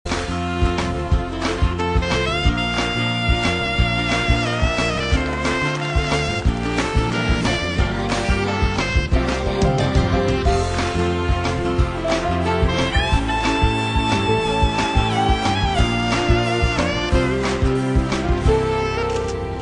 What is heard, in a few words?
Trumpet; Raymonds Ad